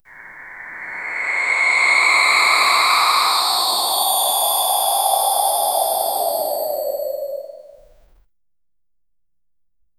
snake sound hissing mythic

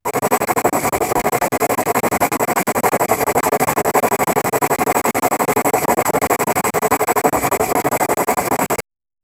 asmr sound effect of a, pencil

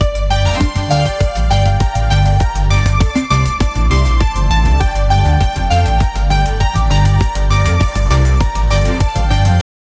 a musical loop in G, disco

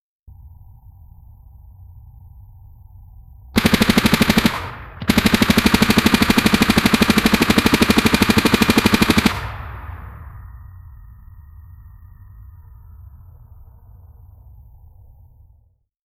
slow powerful machine gun; gunfire

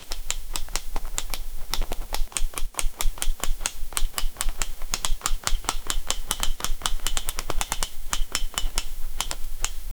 weights bar lifting, gym

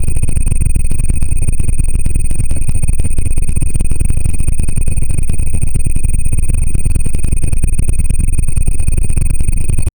short 8s glitch ufo sound

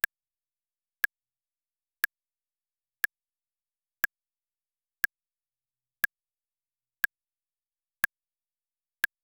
sound for a clicker, button

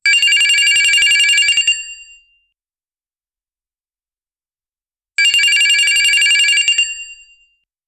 realistic mobile phone ri, ringtone

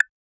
ui click sound inspired

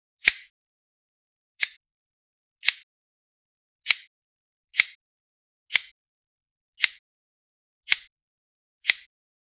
sharp and satisfying s, cutting

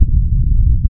low hum continue

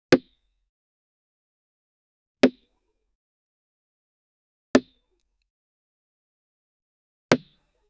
cricket ball hits bat han

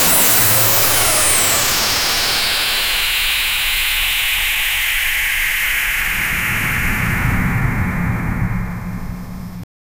laser beam firing, blaster

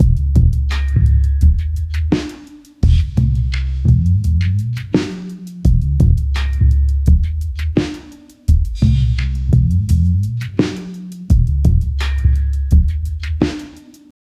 lo fi beat, jazz

